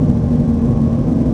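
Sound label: ambient air conditioning machine large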